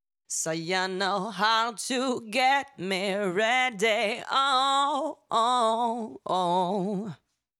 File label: 123BPM; GET ME READY